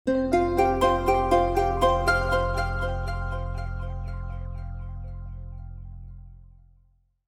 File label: Startup, Computer: Short Musical Style Phrase; Miscellaneous Musical; Stuart Duffield